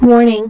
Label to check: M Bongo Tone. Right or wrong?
Wrong